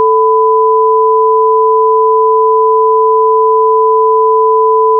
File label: sinc 44100 L440 R1000 stereo